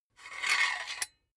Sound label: silicone gun empty pusher push slow; berufe; handwerker; soundpool